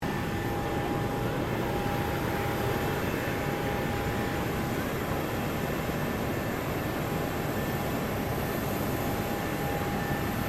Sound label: Ambience Tarmac Melbourne Airport, Airport tarmac samples; Airport Sound Effects; Airports (Sound Effects:Backgrounds); Foundation1